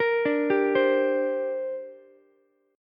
D 7th Suspended 4th Sharp 5th down Ukulele; D7sus4#5 arpeggiated

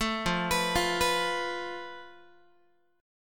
F Major Flat 5th Guitar, chord; arpeggiated

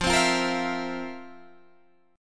F Major 7th Suspended 4th, Guitar, Open G

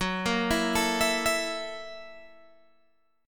Gb 7th Sharp 9th Gb Guitar Open G

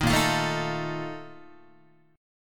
Bb Major 7th Suspended 4th, Guitar

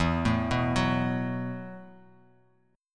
E Suspended 2nd Suspended 4th E, Bass Standard; arpeggiated; JGuitar

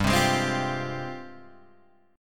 G sharp Major 13th

